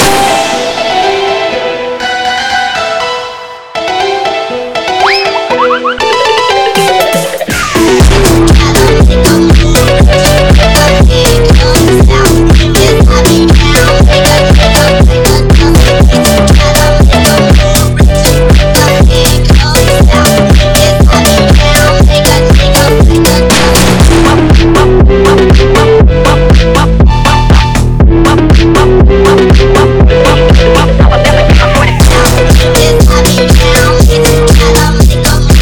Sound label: Phonk